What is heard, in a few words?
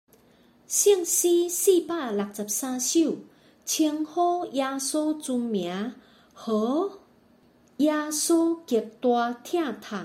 Chorus, children; blest be his name